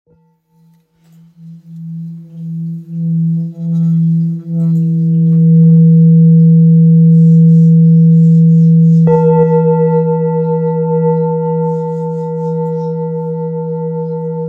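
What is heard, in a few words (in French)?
Thérapeute la dièse, bols chantants tibétains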